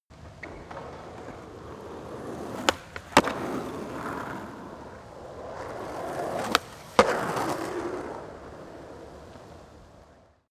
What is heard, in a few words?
skateboard double drive by jump